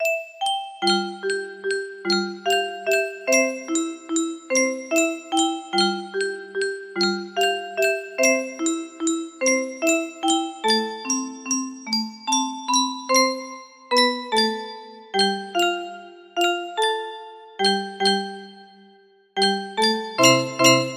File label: music box melody